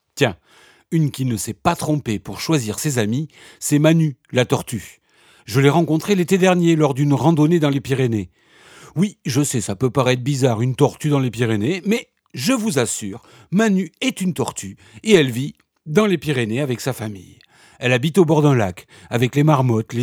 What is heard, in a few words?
transition